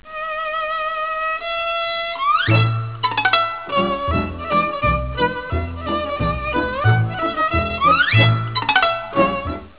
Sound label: violin